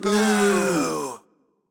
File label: Crowd Boo; Pitch Black Audio Pong